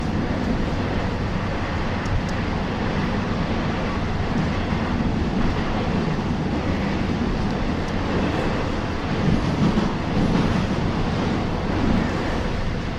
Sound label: fast, Metro